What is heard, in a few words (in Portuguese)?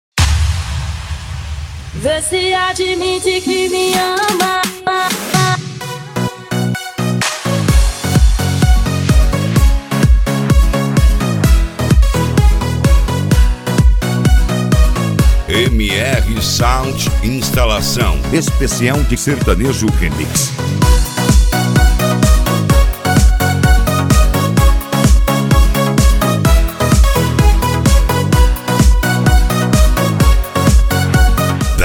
sertanejo remix